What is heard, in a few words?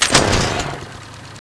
draw, weapons; cso; turbulent11